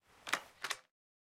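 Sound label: Drop Cartridge; windows 2023; miside demo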